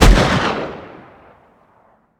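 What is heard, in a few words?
weapon pistol mag fire player atmo ext; gmod; viper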